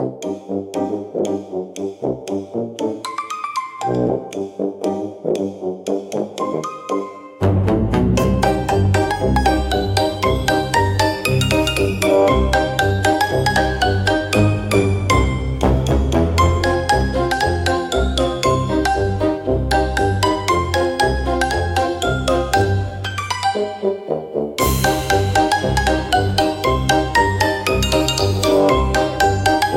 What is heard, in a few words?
Tuba Tumble, comical orchestra for slapstick gag scene